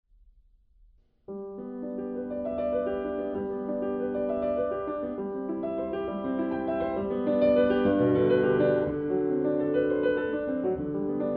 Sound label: angel harmonies